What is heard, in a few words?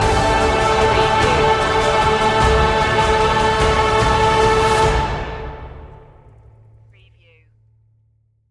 epic hymn stinger